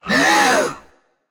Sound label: breath; smite; hades